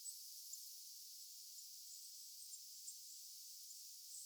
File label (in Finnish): nuo ty tai ti aanet kolme kpl voivat olla sen kuvan puukiipijan